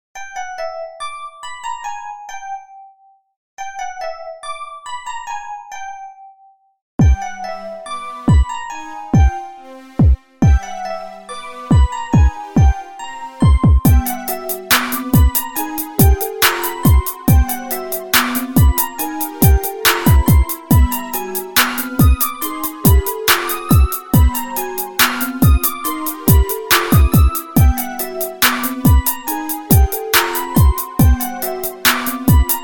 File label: piano